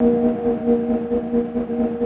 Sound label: GTR DRONE, Synth FX Misc